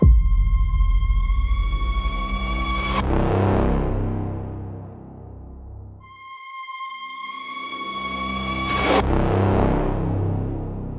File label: aggressive panic for direct fear